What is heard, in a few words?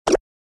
stick